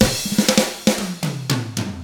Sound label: ROCK